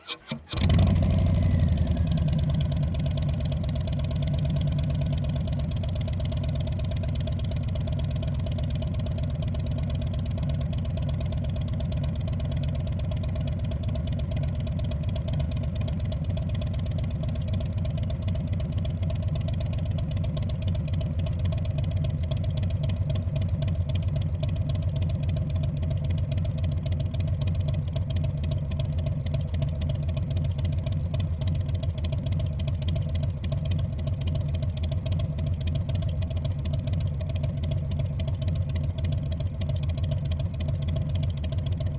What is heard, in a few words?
Softail 107 3.5 Slip-On Idle